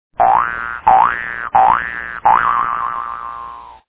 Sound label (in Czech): struna